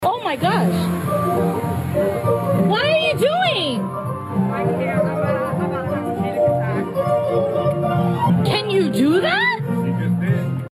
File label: panic attack; ABANDON SHIP; SPLASH MOUNTAIN